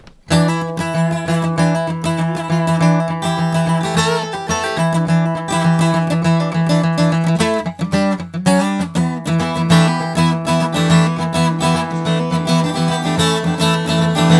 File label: High String; RNP